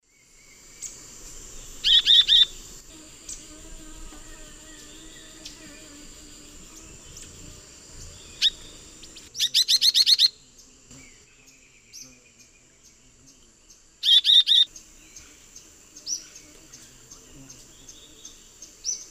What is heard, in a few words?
Euphonia rufiventris, calls female; S. America; Eastern Lowlands